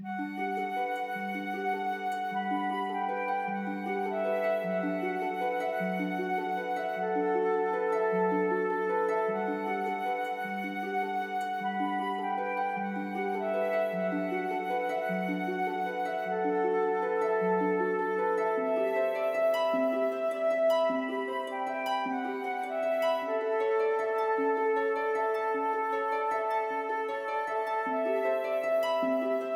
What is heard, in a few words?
cinematic calm